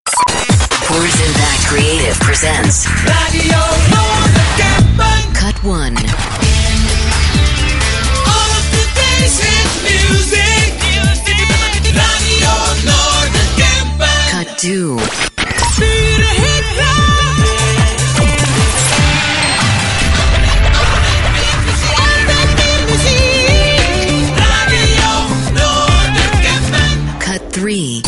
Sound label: Jingles; RNK 2006